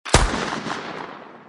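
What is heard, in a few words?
light machine gun shot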